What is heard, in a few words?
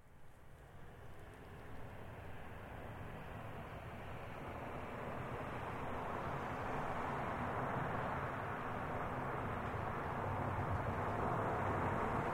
Car Highlander Swerves Skids Dirt; 2005 Toyota Highlander V6; Cars; Vehicles